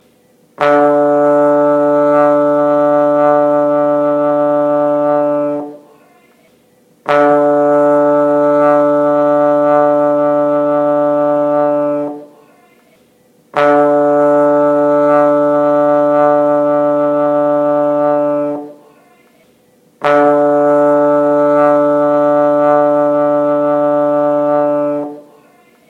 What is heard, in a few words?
trombone d